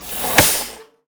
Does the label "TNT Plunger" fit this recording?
Yes